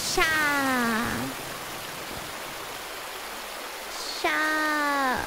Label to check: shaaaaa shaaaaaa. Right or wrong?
Right